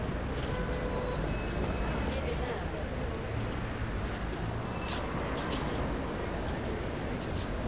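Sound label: traffic ambience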